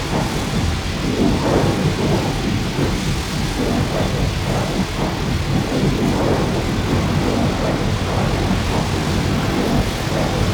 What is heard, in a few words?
Ship Hyper Speed Loop